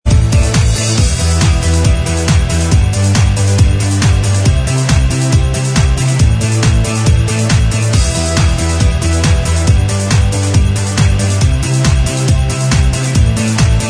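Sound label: instrumental, backing tracks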